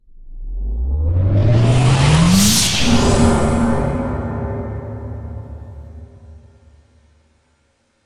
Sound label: Ferengi Enter Warp; NanoFXv2; WarpFX